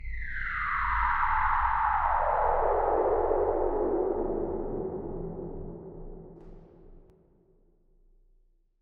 Watery Echo Fx

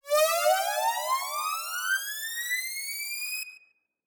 Trap Modded Riser

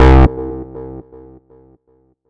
Acid Bass Hit C

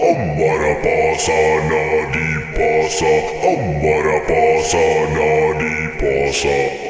Monk Mantra

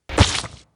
Soft Impact

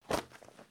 Leather Rustle

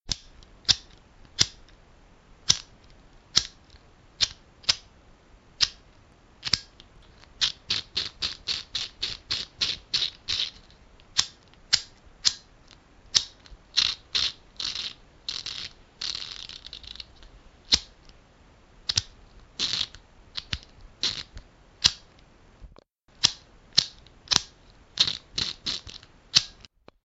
Empty Lighter Flick

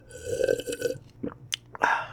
Satisfying Drink

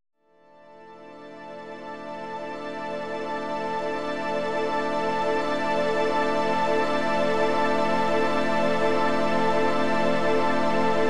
Strings Aminor

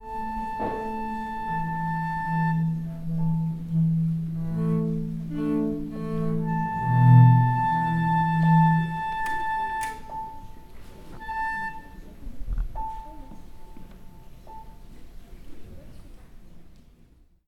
Tuning Quartet

